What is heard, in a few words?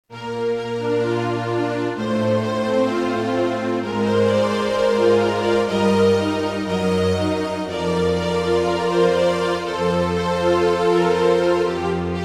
strings allegro